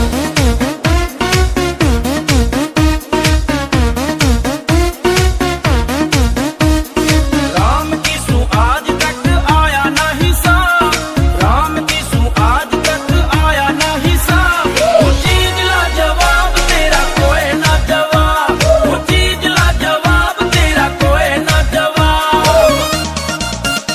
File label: song love ringtone, bollywood ringtones